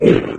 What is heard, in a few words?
melee, flood